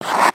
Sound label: ice skate